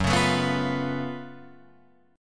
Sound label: F sharp Minor Major 11th, F sharp, Guitar, Open F; strummed